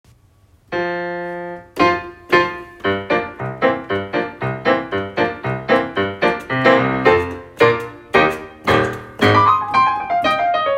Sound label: accompaniment